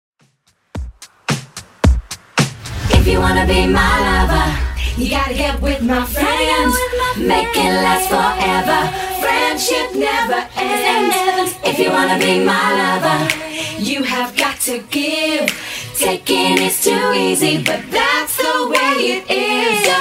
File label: 90s Epic Intro Redrum 110bpm